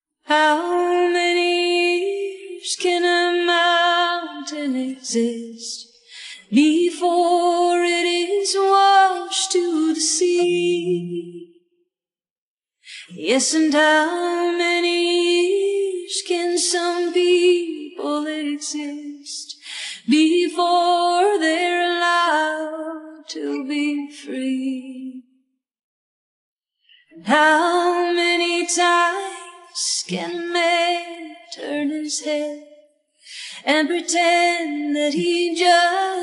vocal

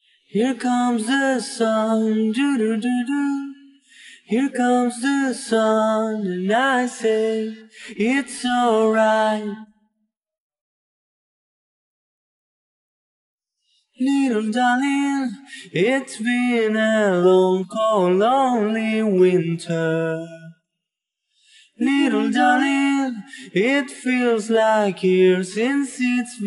sun vocal

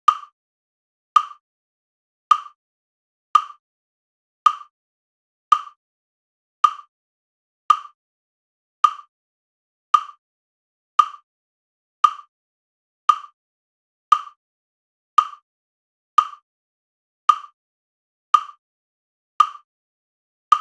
metronome E major 57bpm

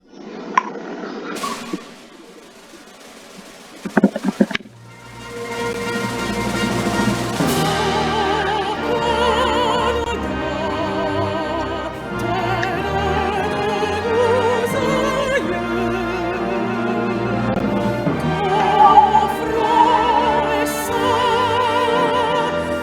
O Canada French; Français

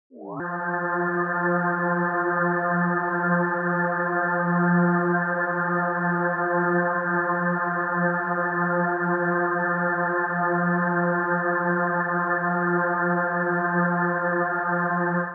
drone; Spatial audio; pannerNode

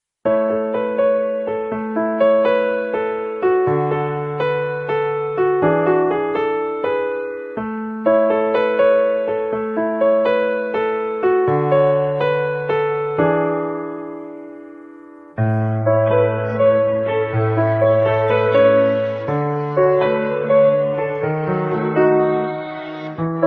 accompaniment

